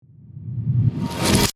Whoosh Suction, Fragments, Swirl, Accent, Transition; Production Element, Imaging Element; SoundEffects-BlastWave